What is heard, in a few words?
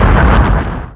door stop; hurrican